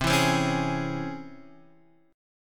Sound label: C sharp Minor Major 7th, chord